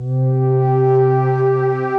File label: Pad; Valley People Pad